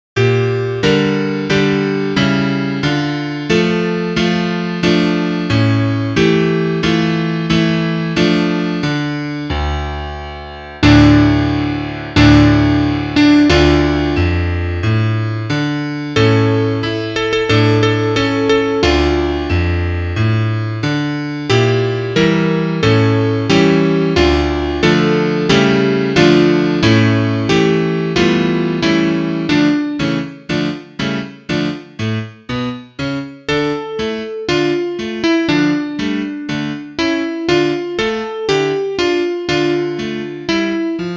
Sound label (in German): Klavier Solo, trompete; Duett